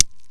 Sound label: Click